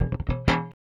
Rickenbacker Bass; Airwindows

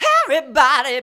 VOX, Singin; Sound & Vision - Gigapack II CD 2

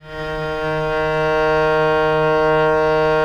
VL D#2, VIOLE, Historical Instruments; USB Soundscan vol.23

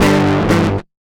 guitar stab

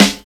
BREAK SNR; Drums In Your FACE!

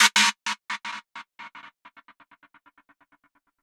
Gated Cymbal; musicradar

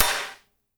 HAT SNR; Spectrasonics; Burning Grooves

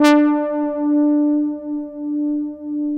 OBERHEIMS

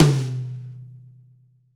Rock Tom 50, Roland BK-5 Toms

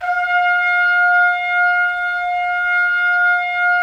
Trumpets; Roland - LA Composer vol. 4

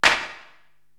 Ambi Clap, Korg TR-Rack

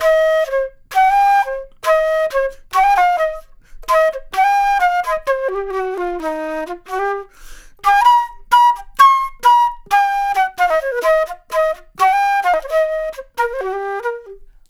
Flute Solo, Jazz

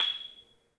MARM, 3D MARIMBA; 3D Sound; 3D Keys VOL-1

